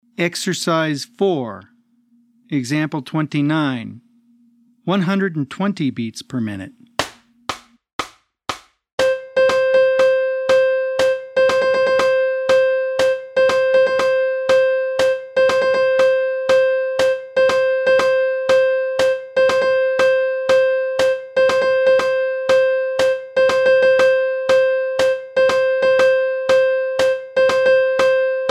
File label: 120 BPM